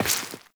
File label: Step Flesh, Footsteps, Walk; Warframe; Tenno